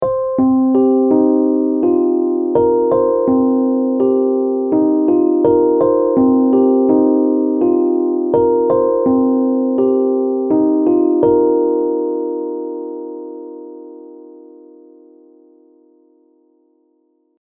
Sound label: electric piano pattern